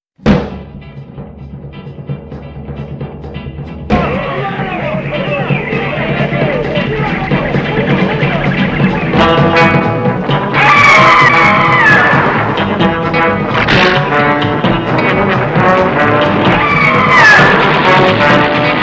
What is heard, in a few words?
Opening Theme With Sound Effects